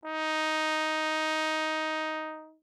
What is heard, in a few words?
Tenor Trombone ff Eb4; Brass